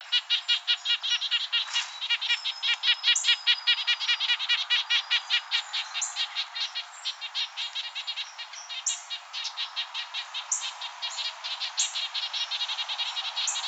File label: Red-breasted Nuthatch, Sitta canadensis; chickadee nuthatch titmice